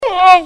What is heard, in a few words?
bathroom